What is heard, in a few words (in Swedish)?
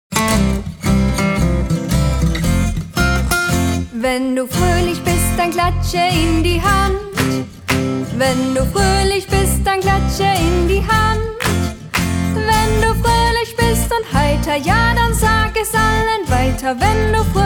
Klappa händerna - Tyska